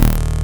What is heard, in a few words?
Infected Bass - D#1, Synths; Multisamples; Ableton Live 12 Lite; Core Library